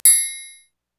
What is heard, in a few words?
TRIANGLE, Korg EMX-1 drum machine